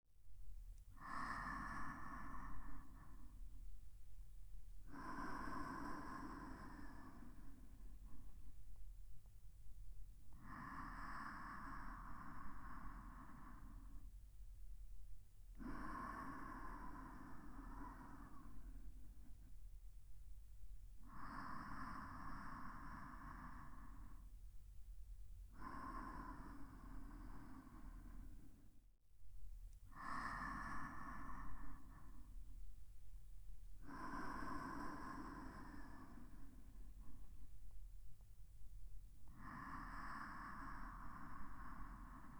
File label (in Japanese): 呼吸音 ループ用